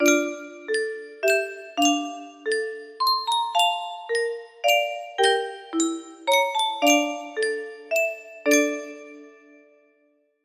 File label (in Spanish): cajita musical, melody; cajita musical muneca